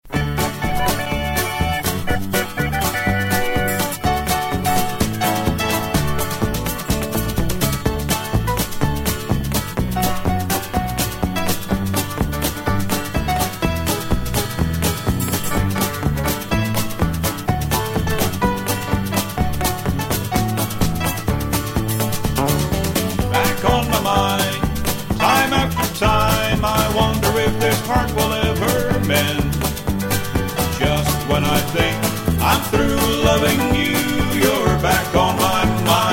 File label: harmony